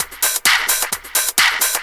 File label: drt 130 addon, DRUMLOOPS, LOOP DANCE